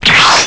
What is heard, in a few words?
Teleport